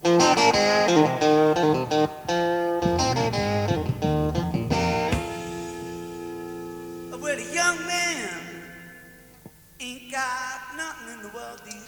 Bass; Amsterdam 1969 EQ Test; 4db Bass

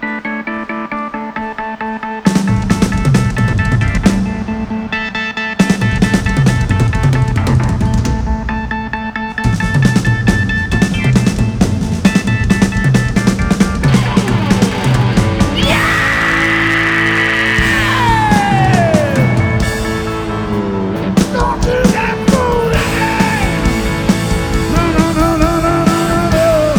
Drum Solo, live; Live In Atlantic City 2015

